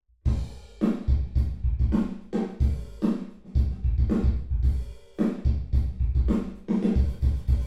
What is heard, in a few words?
drums, binaural; em32 Aalto